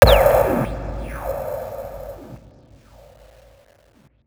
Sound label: Impact; Singomakers; Infected Psytrance; Impacts